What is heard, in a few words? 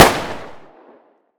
bullet pistol; ufoai; weapons